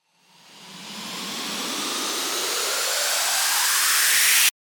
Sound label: RISER